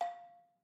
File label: Ethnic Xylo soft mallet F4, Balafon